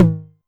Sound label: Tom Hi, Novation Drumstation 909